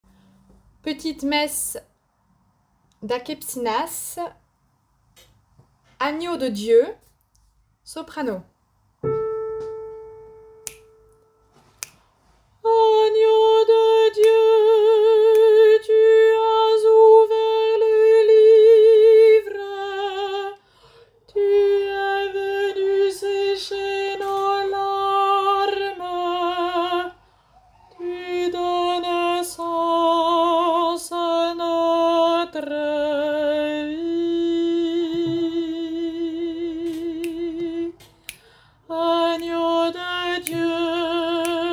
Petite messe Agnus soprano; Akepsimas

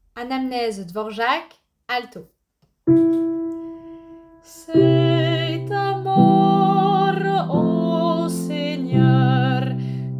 Anamnèse Dvorak alto

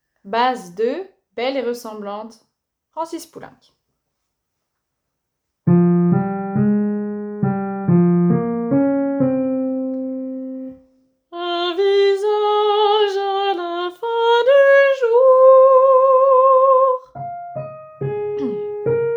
Belle et ressemblante Basse 2

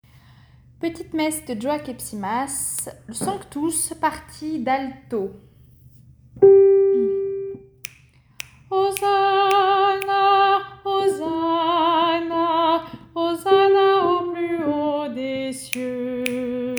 Petite messe Sanctus alto; Akepsimas

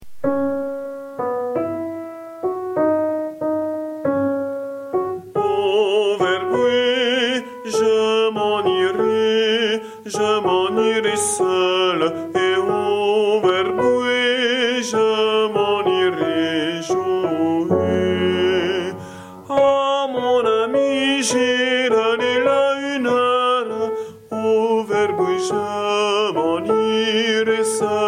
Au vert bois S

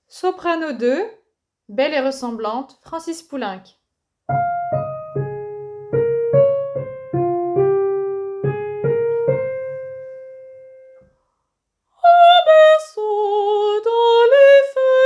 Belle et ressemblante Soprano

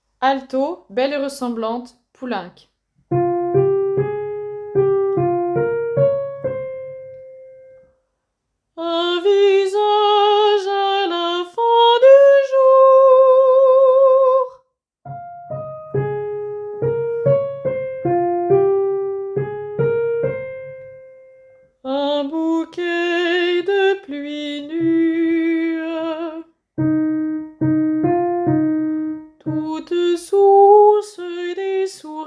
Belle et ressemblante Alto

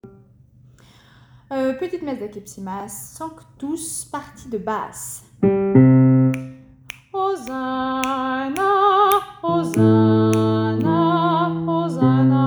Petite messe Sanctus basse; Akepsimas

